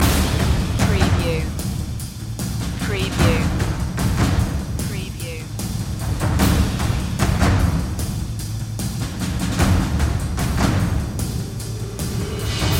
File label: percussion loop